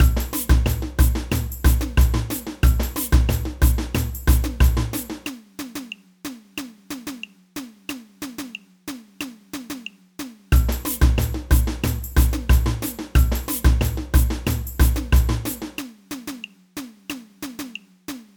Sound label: tamborim, samba; samba band